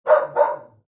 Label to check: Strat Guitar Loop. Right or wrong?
Wrong